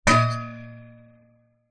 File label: impacts metal solid plate disc hit vibrate resonate